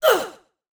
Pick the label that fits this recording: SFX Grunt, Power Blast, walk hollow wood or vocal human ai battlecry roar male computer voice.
SFX Grunt